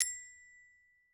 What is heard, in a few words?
gloken